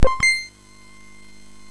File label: sfx, MOS 6581; MOS6581R4; hvsc